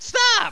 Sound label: stop; action-quake2; marathon